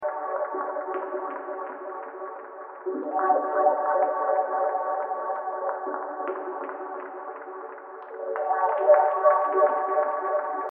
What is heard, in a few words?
Atmo Fm 90